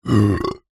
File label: human burp soda